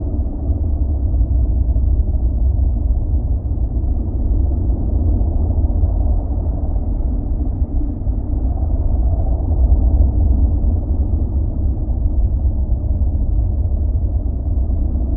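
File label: ambience interior avatar platform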